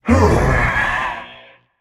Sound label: breath, voicepack; smite; jorm